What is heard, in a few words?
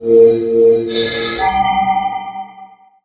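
Skill Revival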